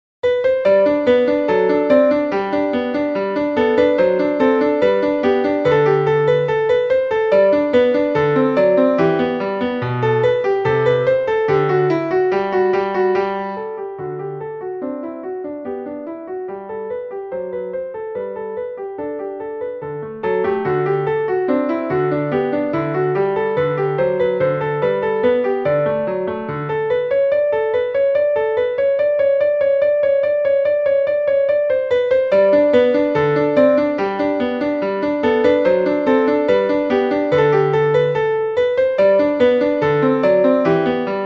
Allegro - First Movement From Sonatina No 1 In G - Piano; Trinity Grade 2